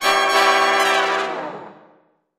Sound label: Music Effect Big Jaz, jazz